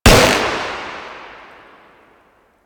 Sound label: Shot Full Auto, Pistol; Weapons; Grineer; Amphis